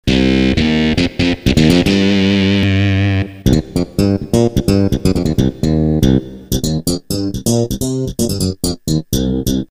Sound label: slap